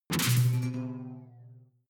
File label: sci-fi alien splash glass; swooshes-swishes